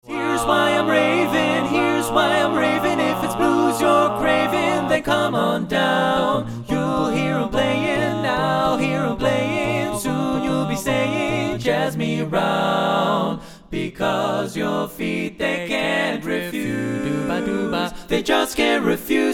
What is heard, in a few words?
Blues